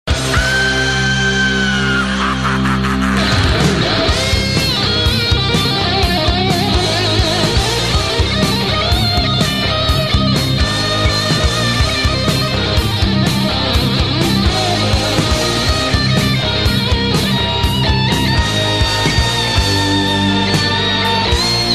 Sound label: guitar solo